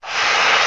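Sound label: REVERSE STEAM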